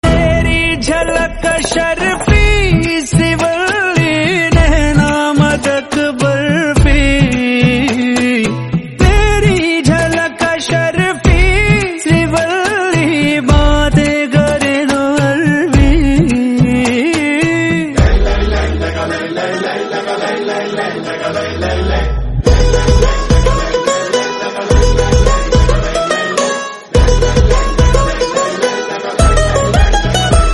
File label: Hindi Version